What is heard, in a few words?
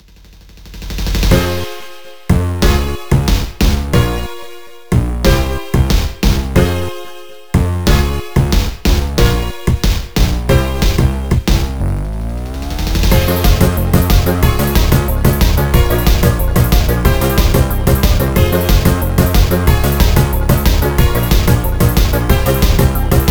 Instrumental